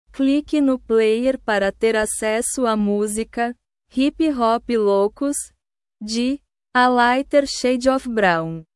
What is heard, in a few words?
hip hop locos